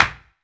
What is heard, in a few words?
CLAP, Human Perc, Body Perc